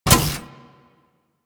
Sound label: Flamethrower Reload; Warframe; Weapons; Ember Deluxe II Flamethrower Skin